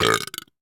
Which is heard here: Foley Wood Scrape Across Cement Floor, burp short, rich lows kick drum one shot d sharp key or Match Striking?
burp short